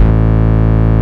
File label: Bass Root A